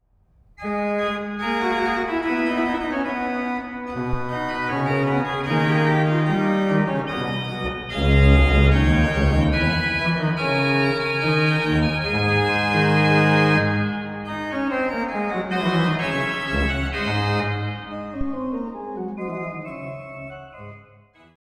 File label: organ; microphone test with organ